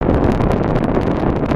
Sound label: thrust